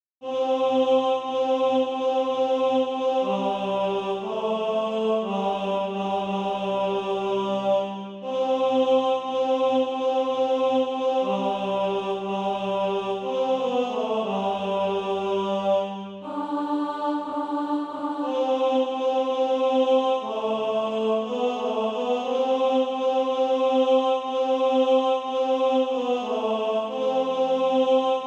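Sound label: tenor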